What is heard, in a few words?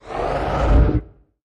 Creature Growl